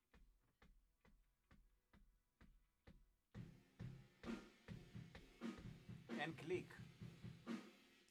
Voc

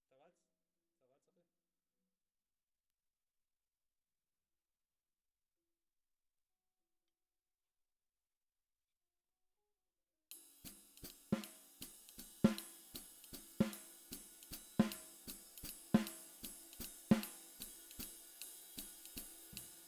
SNR

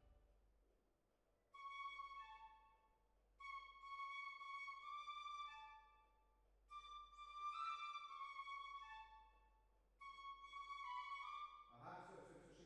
Hall

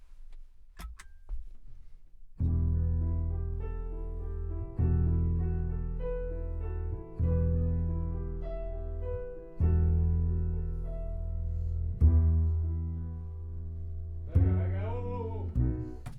C Bass Bot

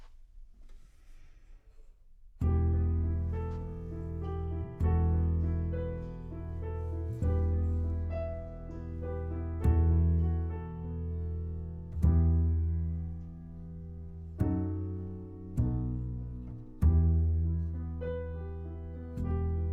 C Bass

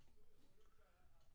Drums

